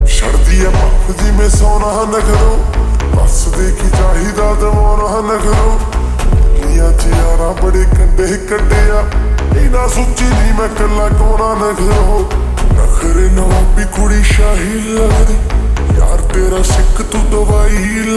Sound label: Ringtone slowed reverb